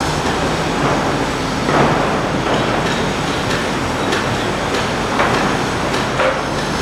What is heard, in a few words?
construction ambience; avant gardens